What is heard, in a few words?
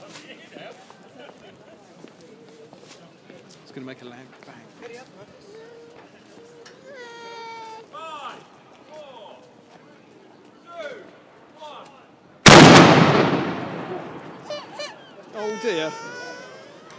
cannon a very big bang; gunwharf keys